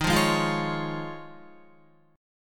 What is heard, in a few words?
D sharp 7th Suspended 2nd Suspended 4th D sharp, Guitar; D#7sus2sus4; strummed; 10,8,8,10,8,9; 4F,4A,5C,5G,5C,6E; chord shape